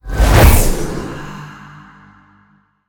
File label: angelic activate; smite; thanatos